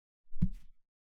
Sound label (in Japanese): ストン, 効果音